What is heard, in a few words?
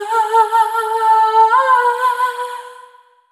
Single Voice Melodic Loops, Vocal Samples; Pocket Diva World Class Vocal Samples